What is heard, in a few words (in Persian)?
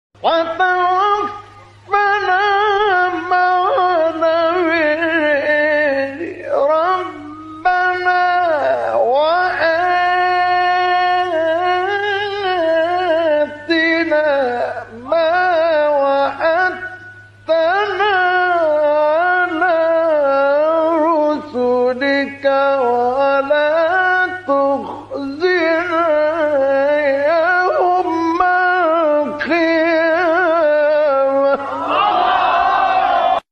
تلاوت سوره آل عمران آیات; استاد مصطفی اسماعیل; ۱۹۳ و ۱۹۴